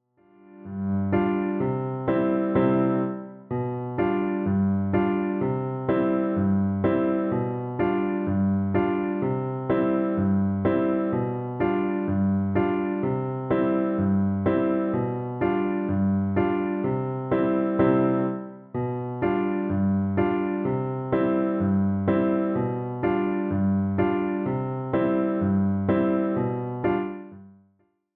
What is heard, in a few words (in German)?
gavotte c dur kl